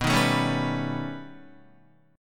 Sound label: B Augmented 9th B Guitar, chord; strummed; JGuitar